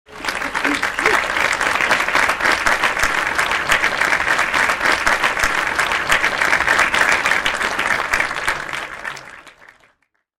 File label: Applause